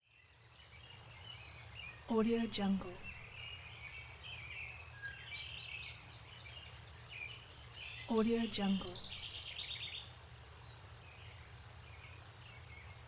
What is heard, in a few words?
wildlife forest ambiance